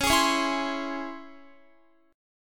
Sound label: Db Minor Major 7th Db Guitar; strummed